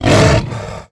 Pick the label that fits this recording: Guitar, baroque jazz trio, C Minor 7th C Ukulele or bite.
bite